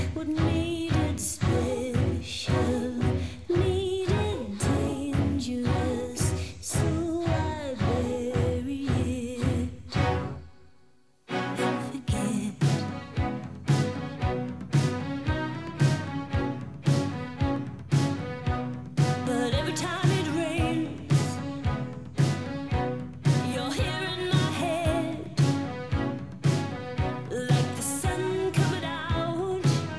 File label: pop